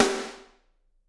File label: SD, Drums; Bob Clearmountain Drums I